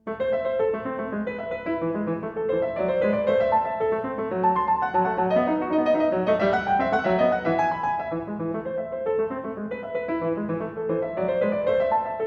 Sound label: Aria variata alla maniera italiana, in A Minor Variatio VIII. Allegro; Bach; Ashkenazy; BWV 989